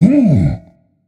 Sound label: notice; smite; voicepack; ra